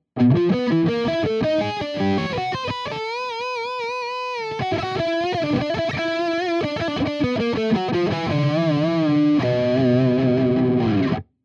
neck lead; ENGL

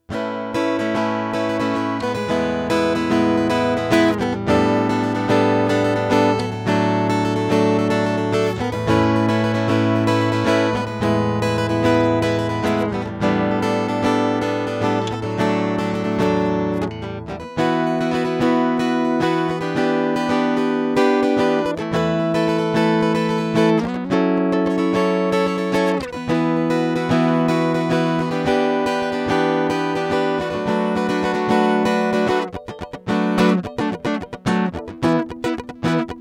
Atlantis multi style

